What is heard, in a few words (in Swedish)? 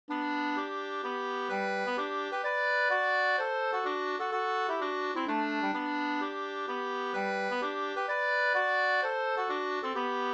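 polska, tvåstämmig; Vangdala Vg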